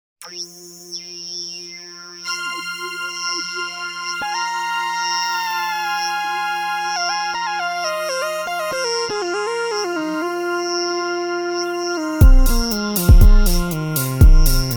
Disco Down; Soundzabound Volume 3 Disc 1

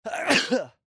Sneeze

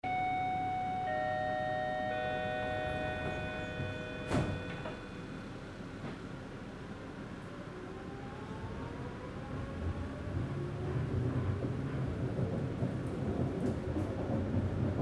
Subway Ride with Dings

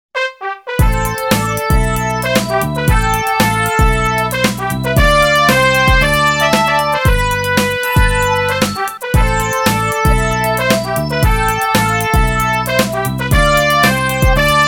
Game Show; Soundzabound Volume 3

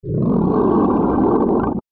monster

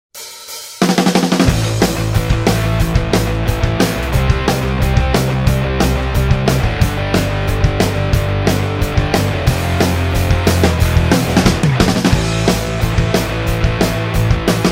Everybody GO! no vocals; Soundzabound Volume 5

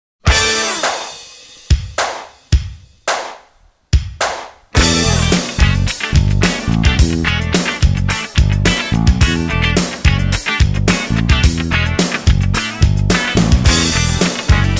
Steady Groove; Soundzabound Volume 4

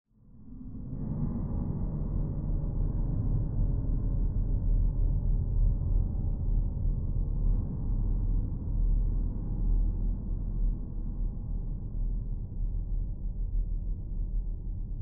Eerie Drone

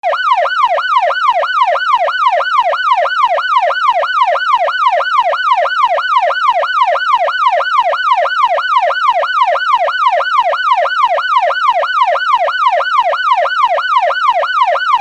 police siren

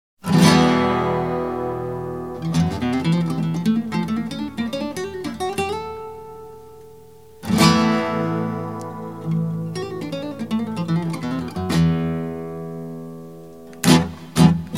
Flamenco Design Spanish; Soundzabound Volume 2 Disc 3

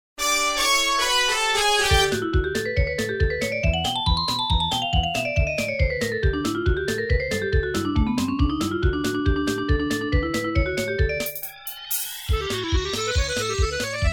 Kartoon Chase; Soundzabound Volume 1

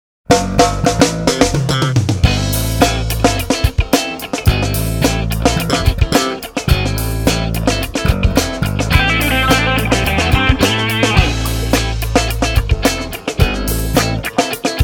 Funky Monkey; Soundzabound Volume 4 Disc 1

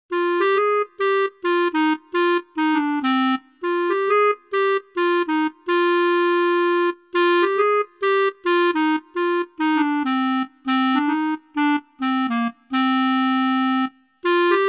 Clarinet Solo; Soundzabound Volume 1 Disc 3

